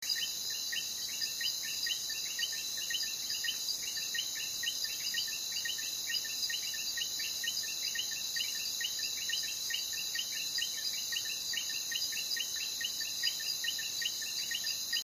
eleutherodactylus flavescens los haitises national park dominican republic; Eleutherdactylus flavescens; northern Dominican Republic, Hispaniola; The call is a short double note; West Indian